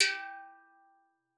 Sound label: G3, STEELDRUMS; Xsample VOL-4